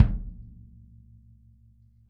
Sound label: kick; Sonic Reality; Interactive Drum Kits; 90s sample cds